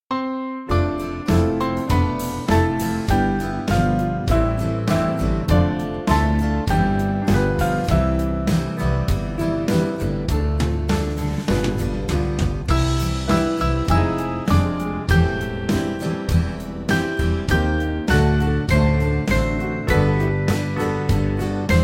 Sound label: Band; Breathe On Me Breath; St Beuno